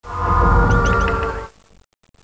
HORREUR; MOBICLIC37; EGYPTE

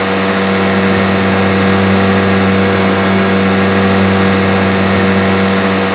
moteur vedette; BaseAuckland2

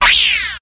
FUITE; MOBICLIC 92; TOUTEDIT

